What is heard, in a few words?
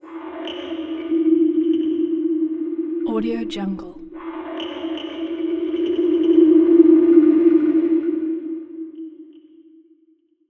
alien presence; prosoundfx